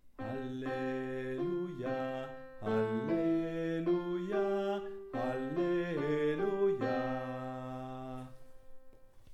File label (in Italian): Alleluia BASSI; Quaresima e Pasqua 2017